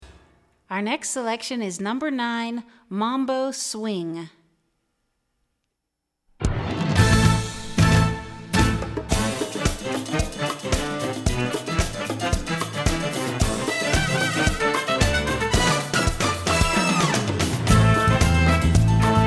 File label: Mambo Swing